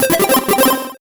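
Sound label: MUSIC EFFECT Solo Chip Arpeggio Positive; startide macos switch windows gamerip 2017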